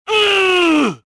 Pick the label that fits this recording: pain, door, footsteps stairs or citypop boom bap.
pain